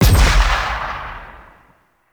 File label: Weapon Punch Anti Aircraft; Heavy Weapons